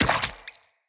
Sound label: Root Spider Mother Step Water